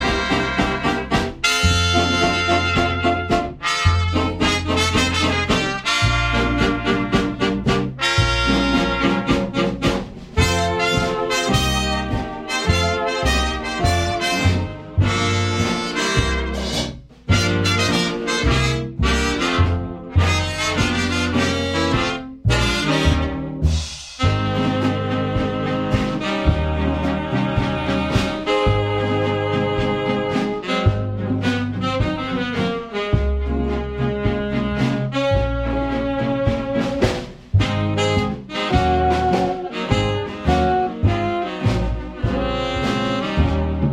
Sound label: Dixieland Shuffle